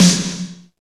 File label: SNR, Cheez Snrs, Roland